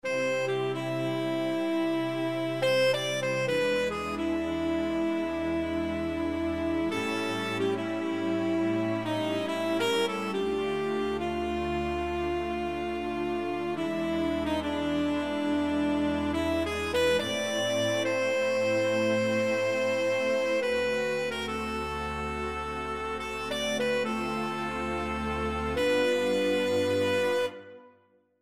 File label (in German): statisch Klavier